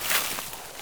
grass step, footsteps; NS Studios - ultra sound collection

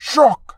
Lightning; Mezafort; AudioWizards

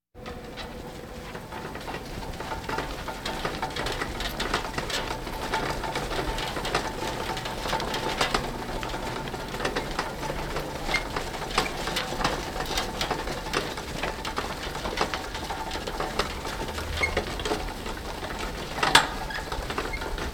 Hospital effects; Valentino Production Sound Effects Library CD50

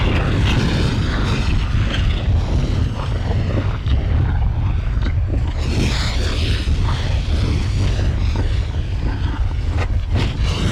volcano; Inquisitor 3

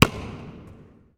Basketball Ball Slap

